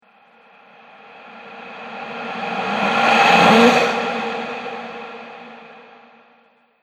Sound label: Shadow Spirit, ghost; horror